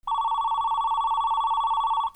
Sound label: PHONES